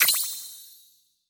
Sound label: Collect Golden Boomerang; boomerang fu